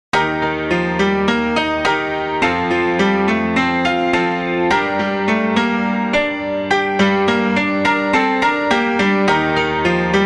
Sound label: piano